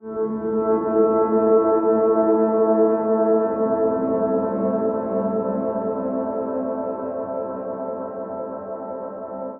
Musical Loop 100 Ambient Keys A Minor; Ghosthack

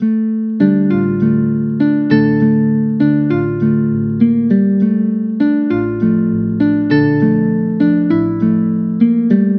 Musical Loop 100 D Minor; Waves; Ghosthack

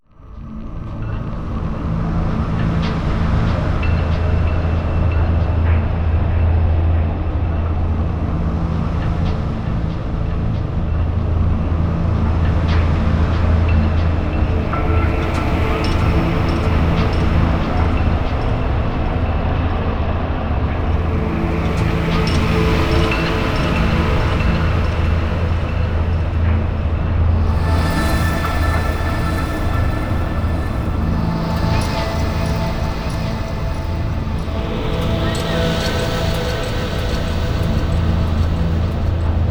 Granular Texture Am 70Bpm WET; Ghosthack; Underground Assembly

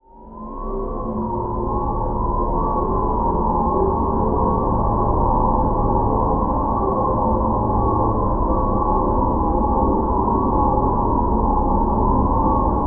Drone, C; Ghosthack; Timeless

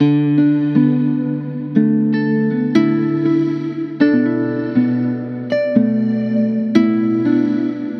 Musical Loop 120 F Minor; Adrift; Ghosthack

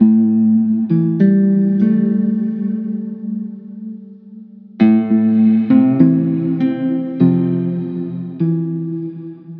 Musical Loop 100 A Minor; Saga; Ghosthack

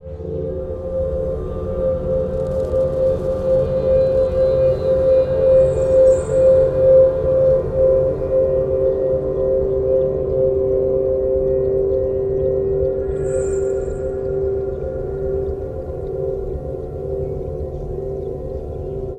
Drone Dm 100; Ghosthack; Nebulae

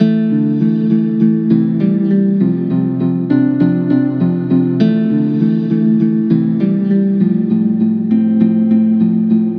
Musical Loop 100 A Minor; Concept; Ghosthack

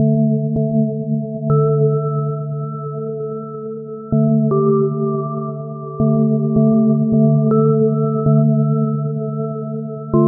Musical Loop, 80, Bell Synth, D Minor; Ghosthack

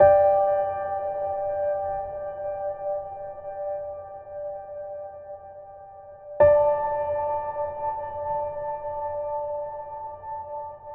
Ambient Plucks D Major

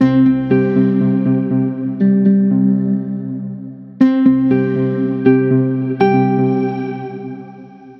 Musical Loop 120 C Major; Perceive; Ghosthack

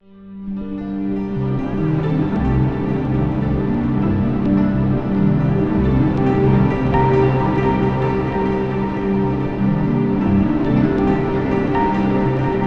Granular Texture Cm 65Bpm; Ghosthack; Reversal

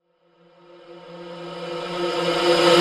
Riser F; Subdue; Ghosthack

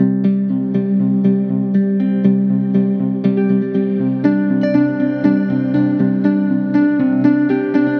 Musical Loop 120 C Major; Envision; Ghosthack

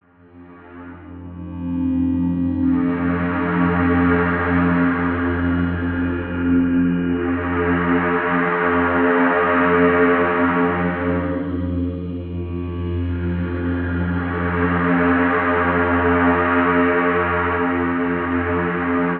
Drone F 100; Ghosthack; Galatea

